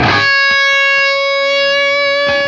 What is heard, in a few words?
LEAD C#4, Guitar; East West; Steve Stevens Guitar Samples Collection